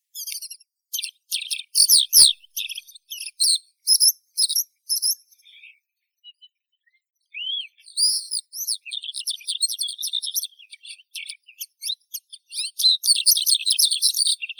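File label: oriental skylark, Alauda gulgula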